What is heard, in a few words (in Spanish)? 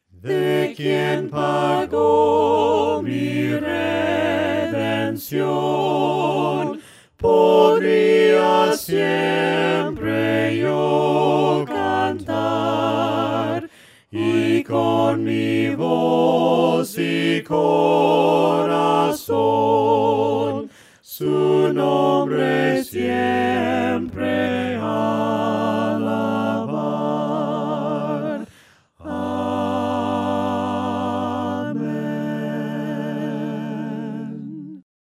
VOCES